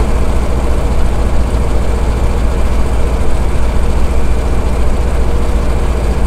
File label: bearcat cockpit r, engines; War Thunder